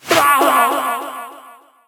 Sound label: Shinobi death